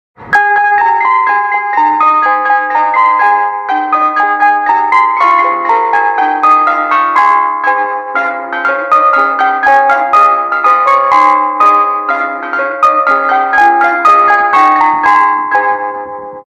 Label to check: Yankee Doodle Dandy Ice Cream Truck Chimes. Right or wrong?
Right